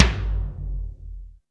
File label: DRY TOM; Back In Time Records; Big Bang